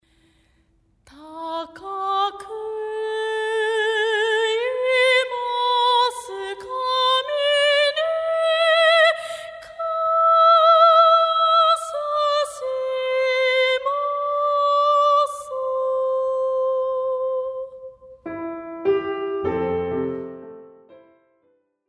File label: Singing